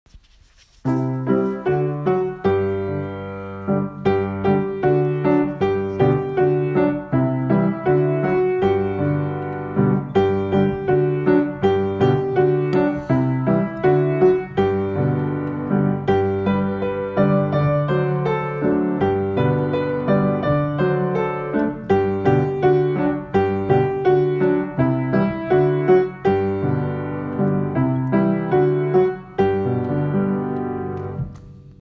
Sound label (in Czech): trumpeta klavir; S Puntíkem a Pepinou za vílou Poupěnkou; jaro; barevné velikonoce